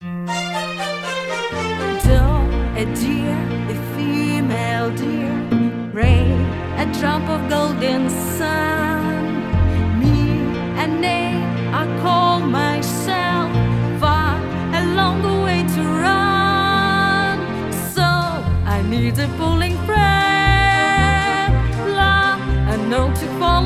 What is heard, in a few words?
jingle